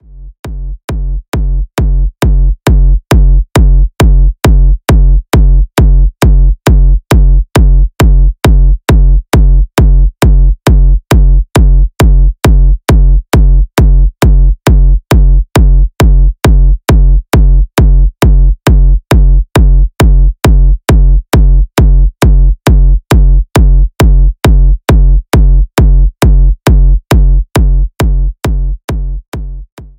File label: signature kicks; zumstein